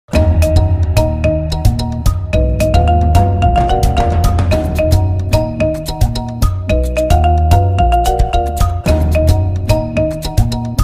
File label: Ringtone, Instrumental; iPhone